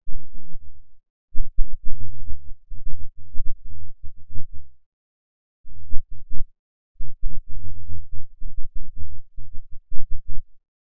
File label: Speech Envelope